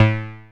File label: OB A3 -R, Rare Synthesizer; Hollywood Edge - Giorgio Moroder Rare Synthesizer Collection; OB 4 VOX.